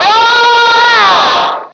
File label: ooohow, Voice; ooh ahh